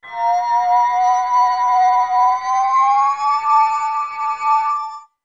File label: teleport; freak fortress 2; empty link; ben